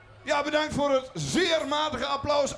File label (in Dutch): zeer matig applaus